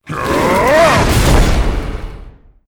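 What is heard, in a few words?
monster attack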